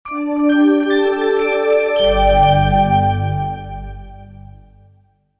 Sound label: Startup, Computer: Short Musical Style Phrase; Miscellaneous Musical; Miscellaneous Musical Sound Effects; Miscellaneous Musical (Sound Effects:Musical); Stuart Duffield